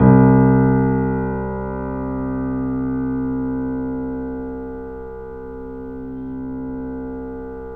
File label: C, The Piano